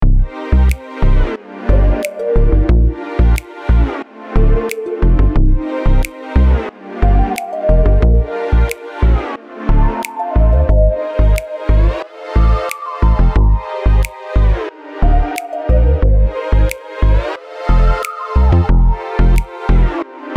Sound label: chord bending